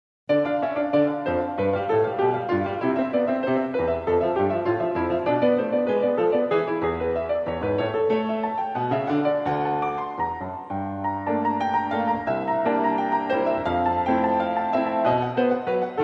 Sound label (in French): Prélude en ré mineur